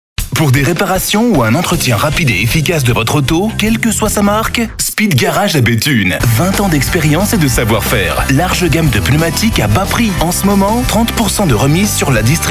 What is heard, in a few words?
Speed Garage